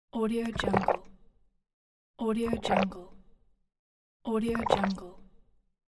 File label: drinking potions